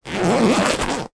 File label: zipper; foley; sound effects; sound effects one